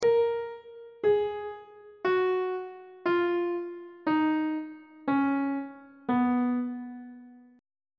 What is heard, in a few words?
c flat lydian mode descending on treble clef